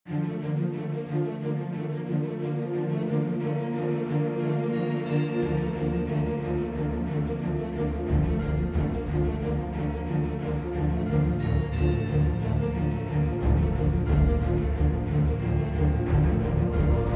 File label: dragons heavy rain 180bpm; soundstage